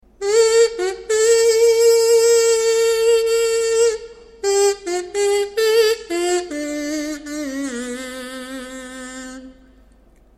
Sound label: kazu